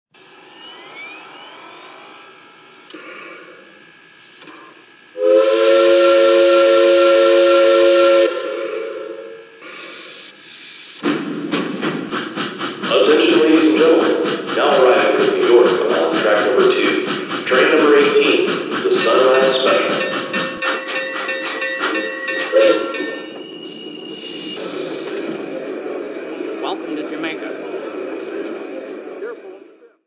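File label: 442 Atlantic, trains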